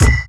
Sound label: Subtle Kick; Sonic Foundry (Sony Creative Software); Esoterik Beatz; Subtle Kit